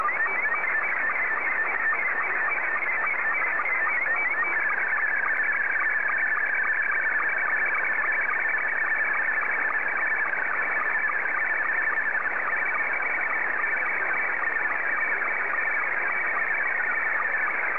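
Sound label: SSTV, Scottie 2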